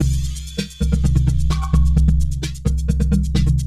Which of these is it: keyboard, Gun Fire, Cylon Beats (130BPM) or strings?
Cylon Beats (130BPM)